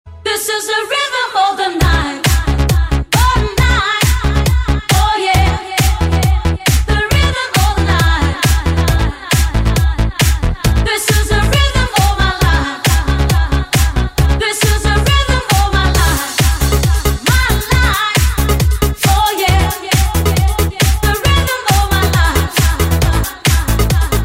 Nhac chuong DJ hay

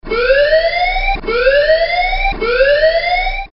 Tieng coi nguy hiem

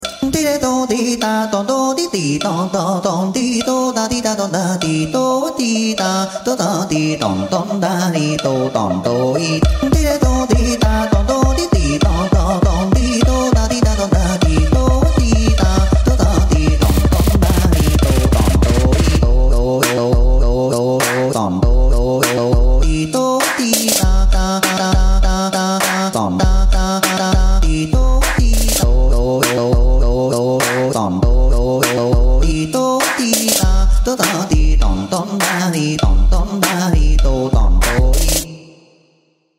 Nhac dam ma remix

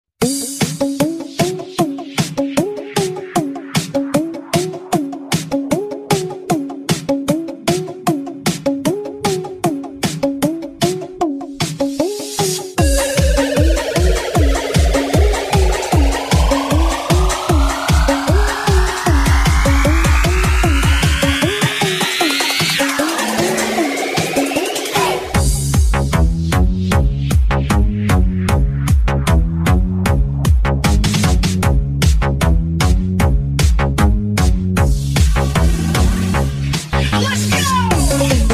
nhac edm thai lan remix gay nghien cuc manh; tiktok

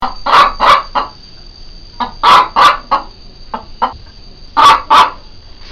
Tieng Ga cuc tac

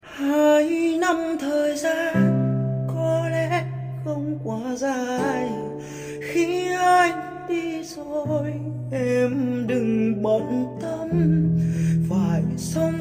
Bai hat

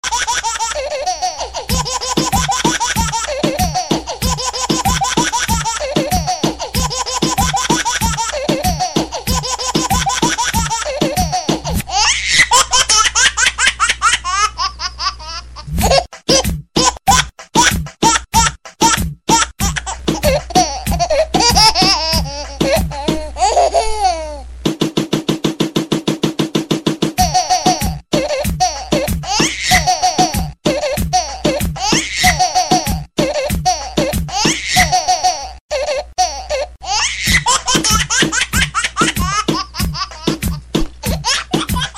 Tieng cuoi remix

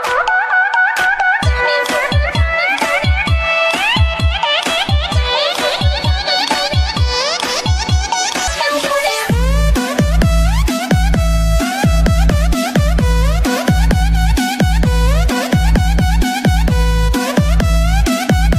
Nhac chuong

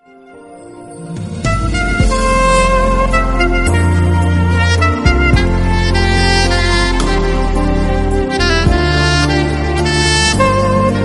Nhac chuong khong loi nhe nhang

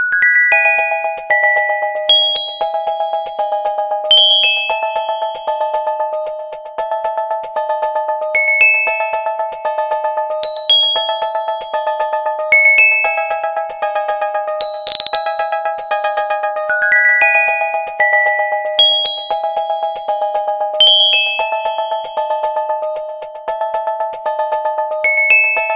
Nhac chuong bao thuc nhe nhang

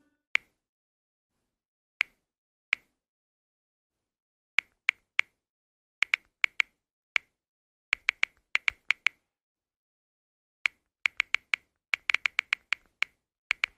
Tieng go phim dien thoai soan tin nhan

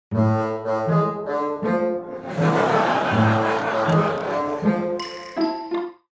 Tieng cuoi va am thanh hai huoc

